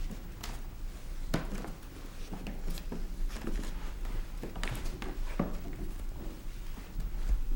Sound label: Squeaking Wood Floor